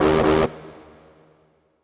Box

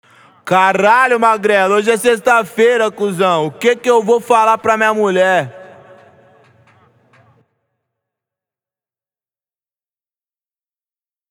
ACAPELLA

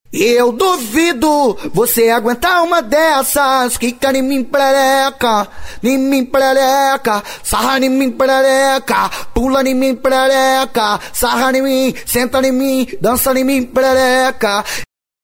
Acapela

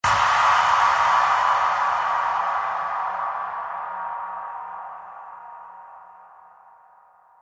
Impact FX; BRENDO DJ

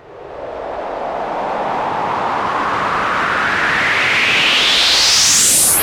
UPFILTER

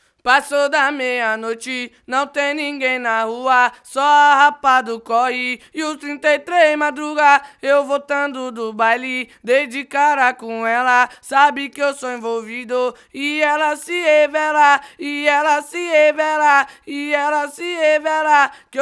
VOCAL 130BPM

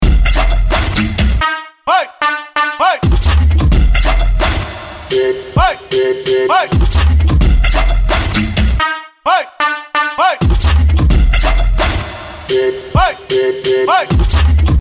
EXPLODE BEAT; DIEGO; BH MALOKA MEGATROM

